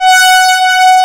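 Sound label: MEMOOG BRASS; E-MU Formula 4000 Series Vol. 3; Analog Odyssey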